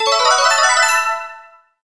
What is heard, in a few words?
gem all; MBHaxe; ultra